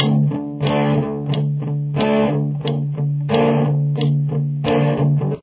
Main Riff, guitar

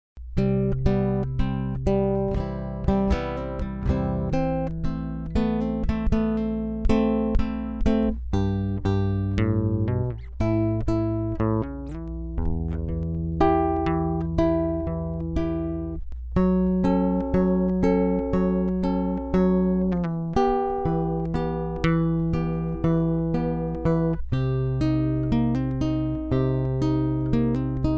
guitar, Guitar 1 (basic fingerstyle); C, Am, G, and E

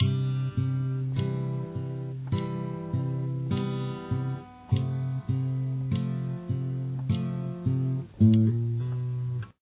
intro slow, guitar

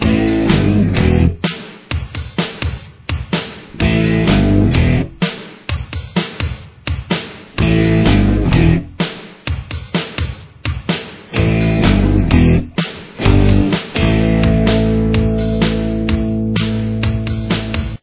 chorus, guitar

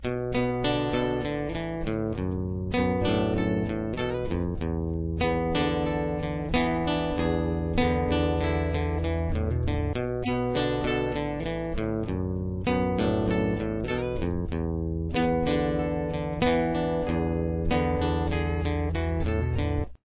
theme break, guitar

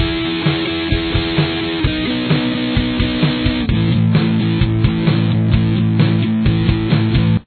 chorus guitar; Guitar 2

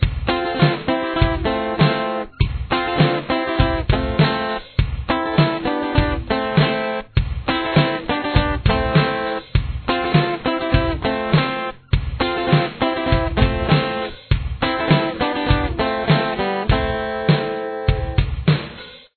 intro guitar

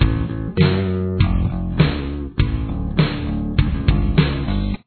chorus bass

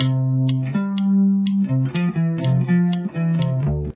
detail, guitar

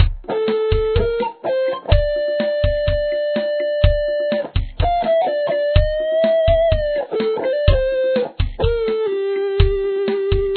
guitar

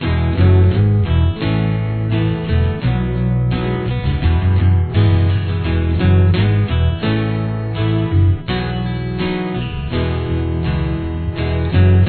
solo

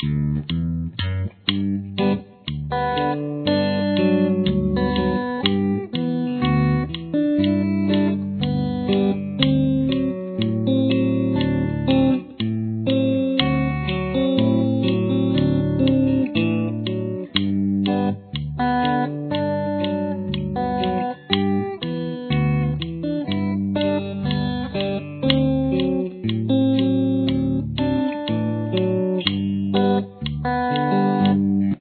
slow, guitar

